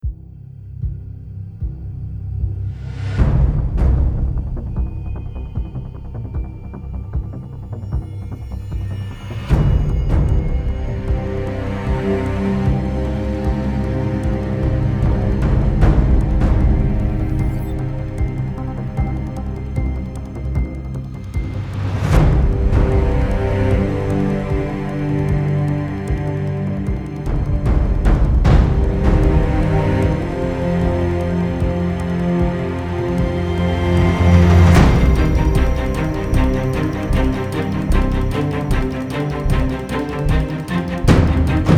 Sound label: thunderstorm, music